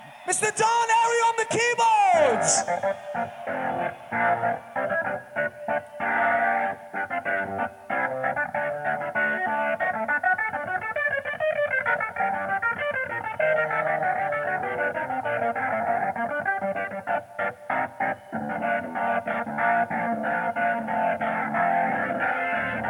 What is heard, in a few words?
keyboards solo